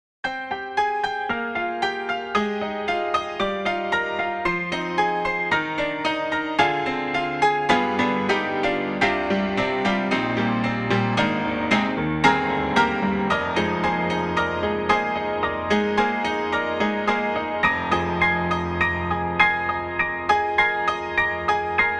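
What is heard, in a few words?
Hand Octaves, piano; Steinberg HALion 6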